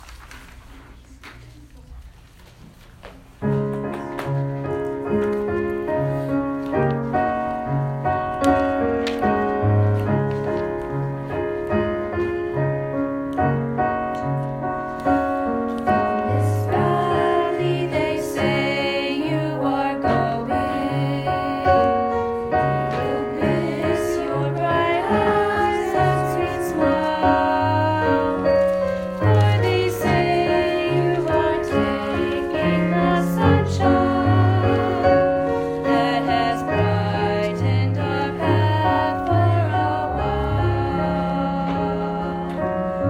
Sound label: with vocals; red river